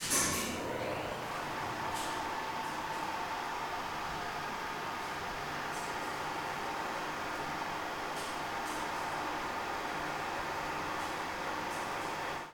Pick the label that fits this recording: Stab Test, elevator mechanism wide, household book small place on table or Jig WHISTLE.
elevator mechanism wide